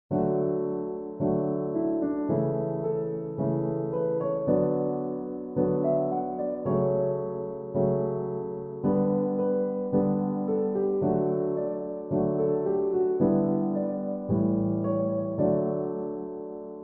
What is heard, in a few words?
Thee, piano arr.